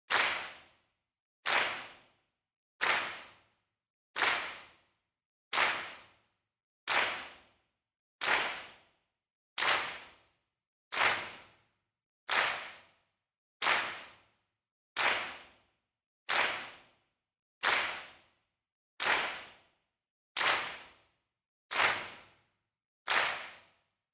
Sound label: Handclap, Slow